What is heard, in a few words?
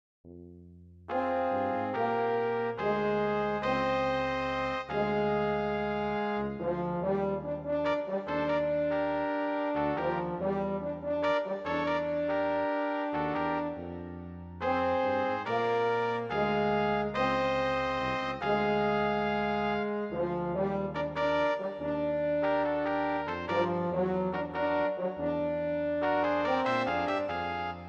brass quintett